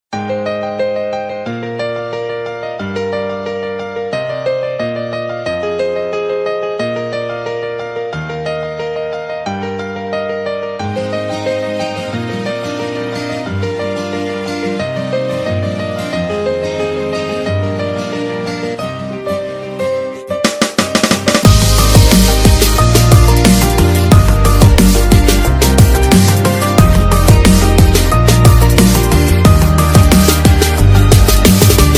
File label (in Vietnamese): nhac chuong piano khong loi